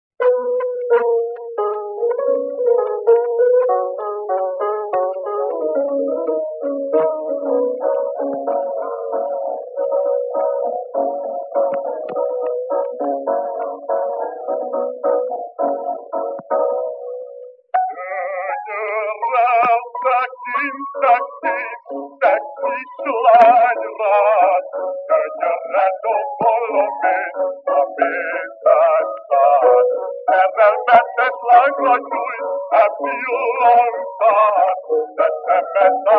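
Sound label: Baritone solo, Cylinder; Czimpilimpi 1904 Columbia Cylindar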